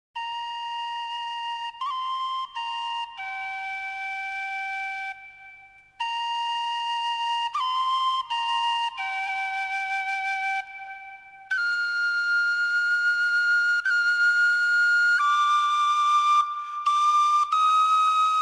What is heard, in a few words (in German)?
Indianische Floete Alt